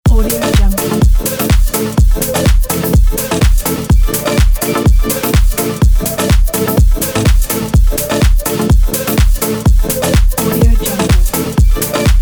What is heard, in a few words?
progressive house loop